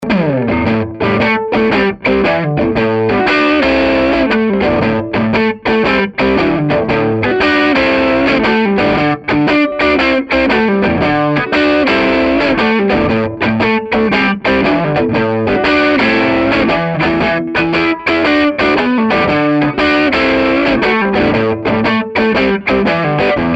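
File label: classic crunch neck, humbucker; schwarzbach; HB59-Classic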